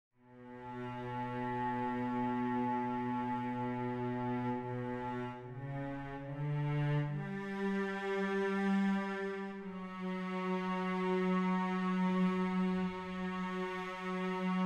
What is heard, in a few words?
Cellos